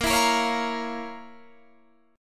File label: F Major 7th Suspended 4th Sharp 5th; 18,19,20,18,18,18; chord shape